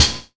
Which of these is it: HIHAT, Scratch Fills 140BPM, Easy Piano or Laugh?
HIHAT